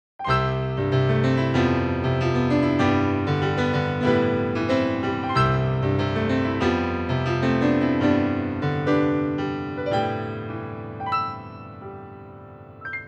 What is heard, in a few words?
stage piano